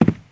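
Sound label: footsteps carpet; Spearhead Demo; MacFormat 2003 June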